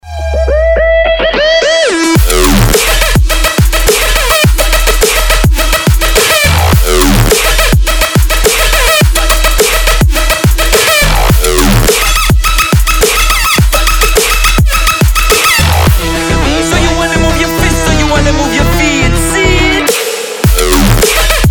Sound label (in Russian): Жесткий brostep